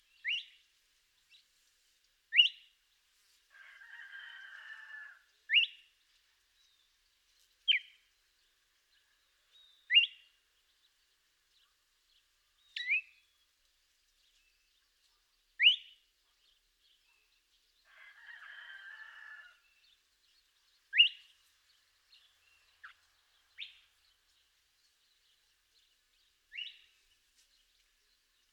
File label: Blue Mockingbird Melanotis caerulescens caerulescens